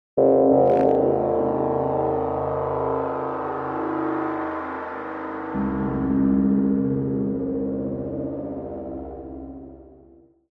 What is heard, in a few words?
ATMO Chaotic Impulse; Phasewaver; syntheway